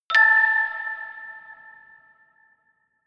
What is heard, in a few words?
notification glockenspiel creepy fourth